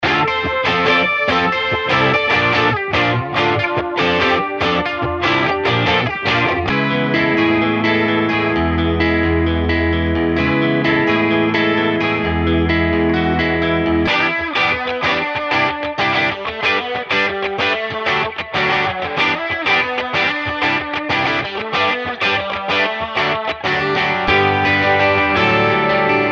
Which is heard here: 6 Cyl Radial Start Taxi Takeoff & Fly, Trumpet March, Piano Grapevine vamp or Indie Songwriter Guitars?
Indie Songwriter Guitars